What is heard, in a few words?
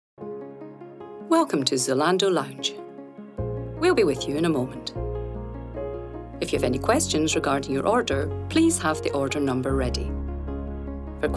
Lounge Music Mix